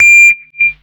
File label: STRA BELL, Hard & Loud Techno; USB Soundscan vol.01; AKAI